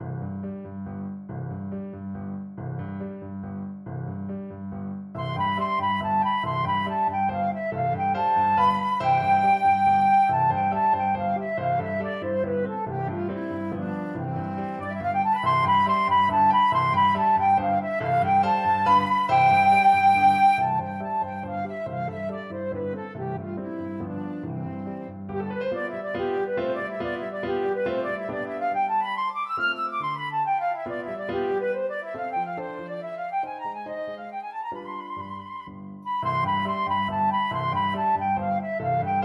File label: chaminade lolita flute